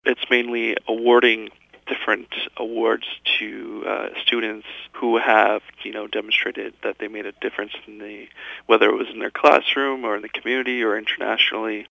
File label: applause; The Timmins and District Multicultural Centre Hosts an Evening of Applause